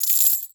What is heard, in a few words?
Coins Drop; SFX Producer